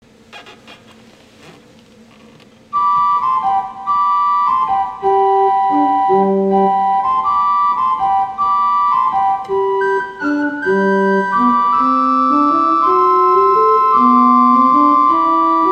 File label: Siciliano, organs; StRupert; Alcock